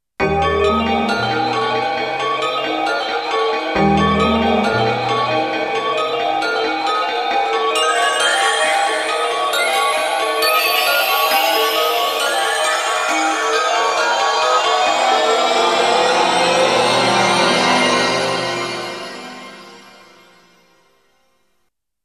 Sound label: yamaha ex